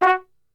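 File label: F#2, flugelhorn, brass; Best Service; Brass Super Section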